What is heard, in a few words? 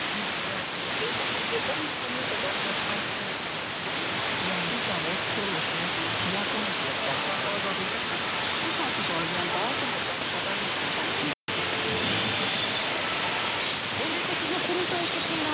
15265khz Radio Liberty AM